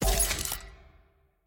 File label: ui season button click